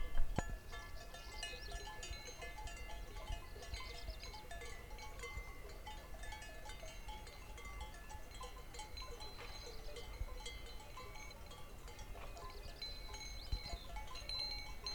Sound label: Cowbells In The Distance In A Peaceful Atmosphere In The Alps